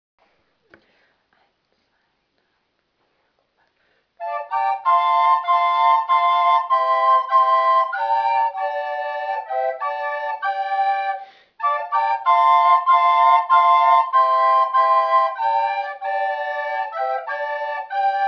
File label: gaillarde; spielfolk